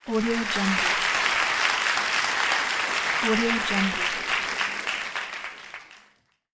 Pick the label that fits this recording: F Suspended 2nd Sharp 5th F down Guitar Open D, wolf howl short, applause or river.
applause